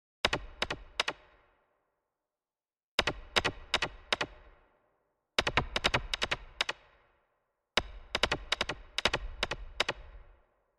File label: Ricocheting sound of bullets bouncing off shield.; Bounces; Objects